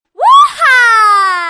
Flirty Shout

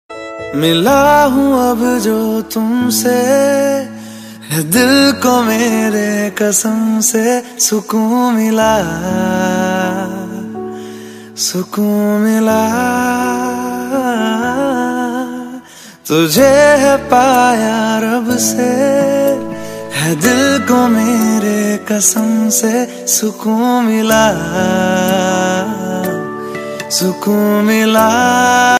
Romantic

